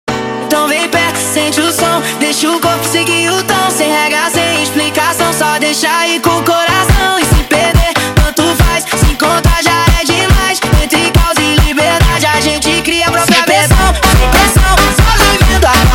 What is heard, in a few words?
Montagem sped Up